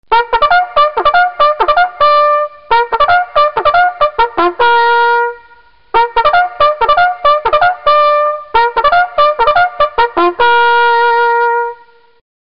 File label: Officers Call, bugle; BugleCalls